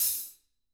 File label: ROCK A HAT; ILIO; Double Platinum Drums 2